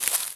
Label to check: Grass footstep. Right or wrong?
Right